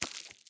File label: spider, fst animal; skyrim